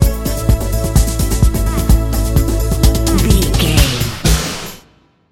climb higher bumper, Dance, EDM, Club, Eurodance, Energetic; Exercise; Aerobic; Aerobics; Spinning; Workout